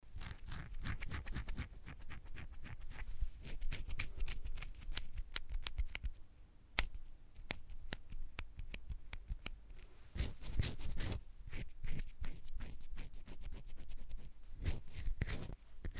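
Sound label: scratching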